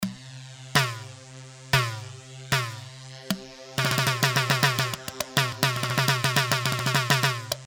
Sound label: Khashba; خشبة